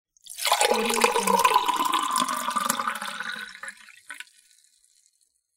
pouring into a glass

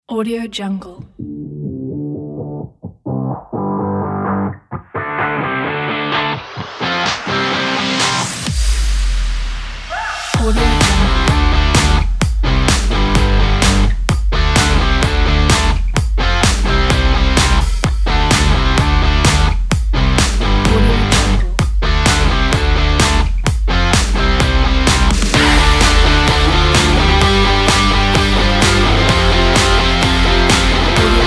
fun energetic pop rock

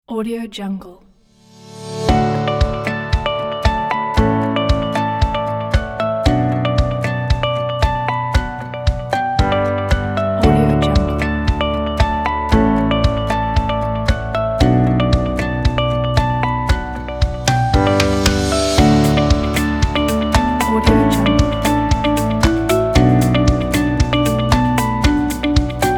emotional motivational corporate music pack

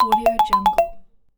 pop ringtone

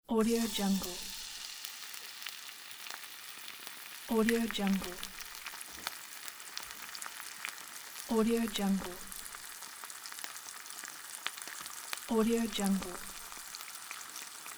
frying food

